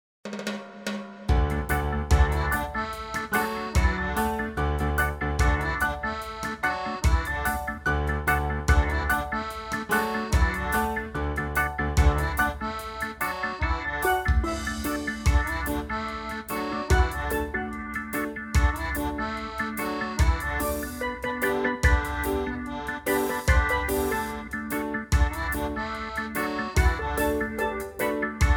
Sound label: no bass